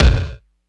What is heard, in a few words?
Dirt Kick; Drums; Kicks